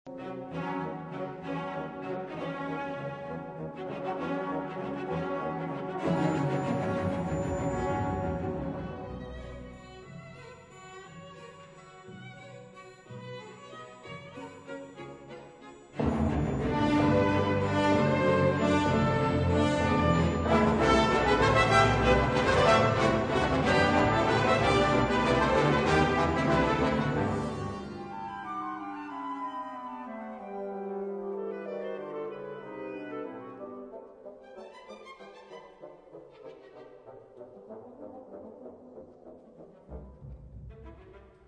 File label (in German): Rundfunkorchester Symphonie E-Dur; Hans Rott; Symphonie Nr. 1; III Scherzo